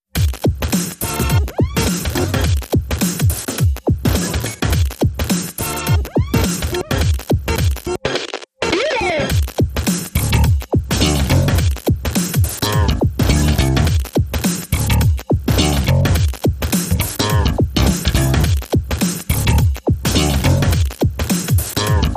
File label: Bass